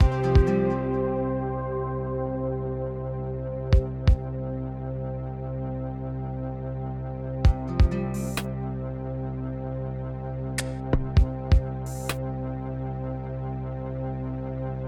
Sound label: Forest Intro